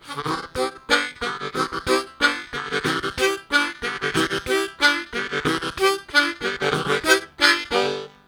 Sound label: Ragtime Bass, Harmonica; Zero G